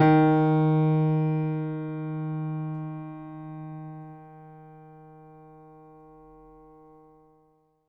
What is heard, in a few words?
MIX R, Acoustic Piano, PIANO STEREO; InVision Interactive; Miroslav Vitous